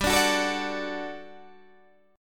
G Major 7th Suspended 2nd G, Guitar Standard; GM7sus2 strummed; 15,17,16,14,15,14

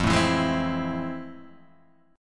F Major 7th Sharp 9th, F, guitar; Fmaj7#9 strummed; JGuitar

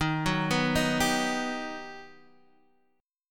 G Augmented Major 7th

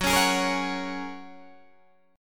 Gb Major Add 9th, down, Guitar, Open D

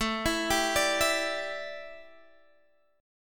A 5th 11th A, guitar chord; A511 arpeggiated

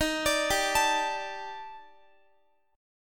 D sharp Major Sharp 11th, Guitar; D#M#11; arpeggiated

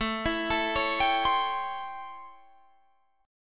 A 7th Sharp 9th A, Guitar Standard; arpeggiated; JGuitar; 17,19,19,18,20,20

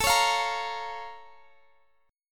Bb Minor A; Bbm/A

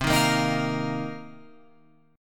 C Minor 11th, C, down, Guitar, Open F; Cm11 strummed

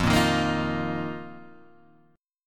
F 7th Suspended 4th F down Guitar Half Step Down

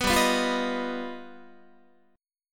G Augmented 7th, Guitar; G+7 strummed; JGuitar

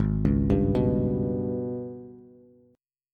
Ab Minor Major 7th Double Flat 5th, down, Bass; AbmM7bb5; arpeggiated

